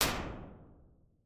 PIPE & CARPET, Impulse Response

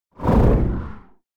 Whoosh Short And Deep Sci-Fi; Hollywood Edge; sound designer's toolkit v2

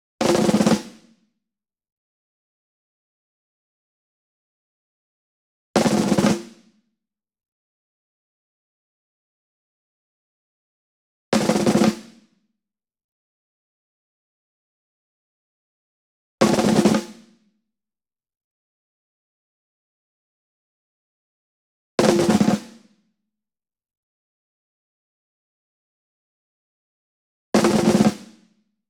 ENSEMBLE SNARE Group Roll Near; boom library; Cinematic Strikes Construction Kit

